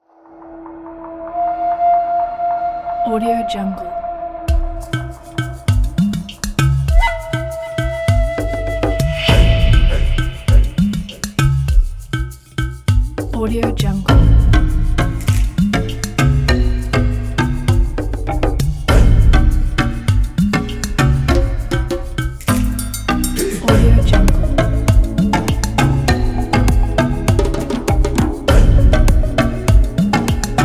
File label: tribal forest; flaviocortizo